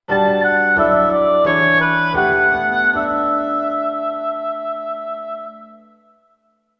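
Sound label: hautbois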